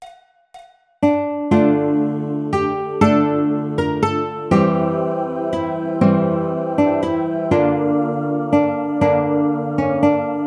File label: Baritone ukulele; Greensleeves